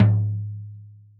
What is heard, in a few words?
floor